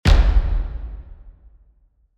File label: Toms